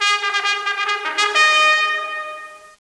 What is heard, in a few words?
fanfare; Caesar III